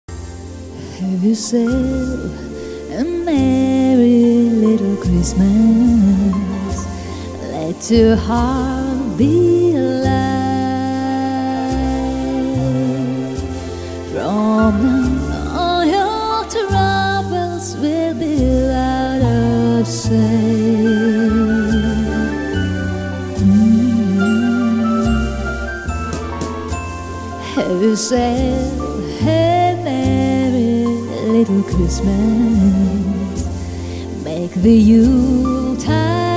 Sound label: song